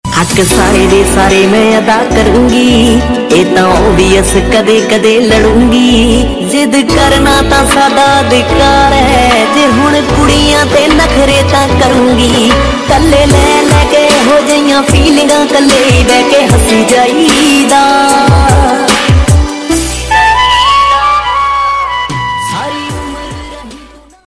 dj remix ringtone, bollywood ringtones

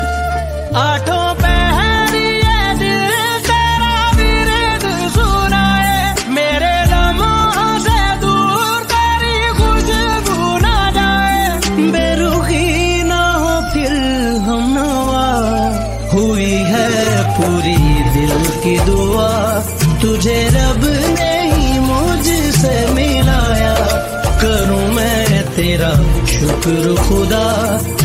drama ringtone; berukhi

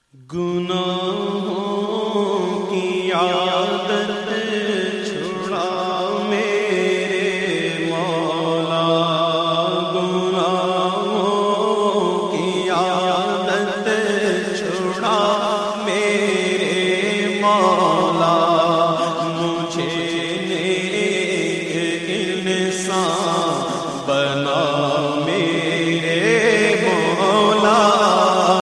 islamic naat ringtone

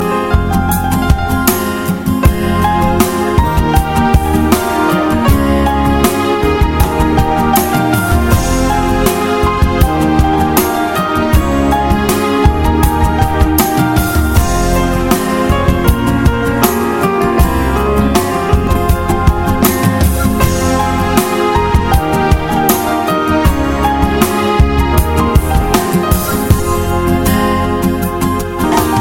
guitar and piano solo ringtone

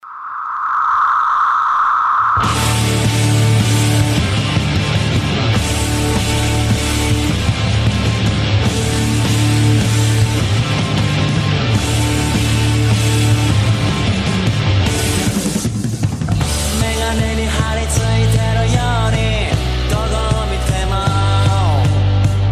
blues drive monster ringtone

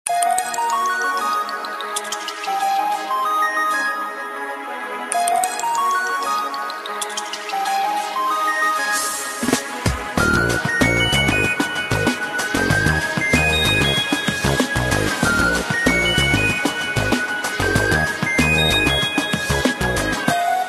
nokia n91 ringtone

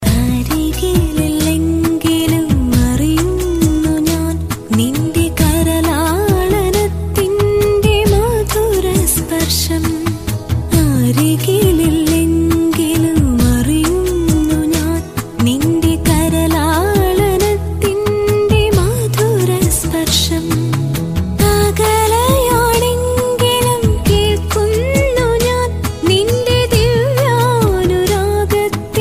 malayalam ringtone

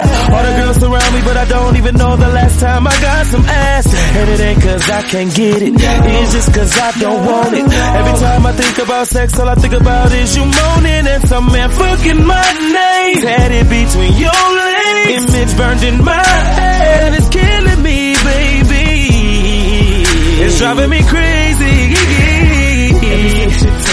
trending english tone ringtone; samsung ringtones

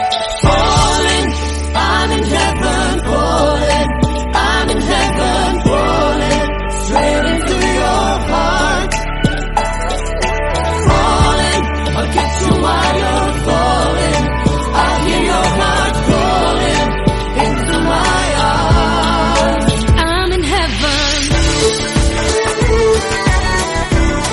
jazz music tone; nokia ringtones

